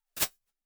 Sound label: Doubler, Reverb; Big Gee's